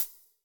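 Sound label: Cymbal Wet Hat, Drum Machines, Alesis D4; 300 Drum Machines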